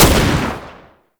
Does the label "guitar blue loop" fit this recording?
No